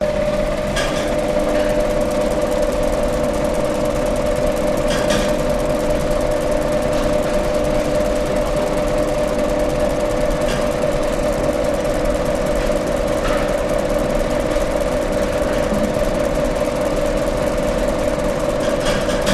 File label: German Bakery Stirring Machine Running Bakers Working In Background; your free sounds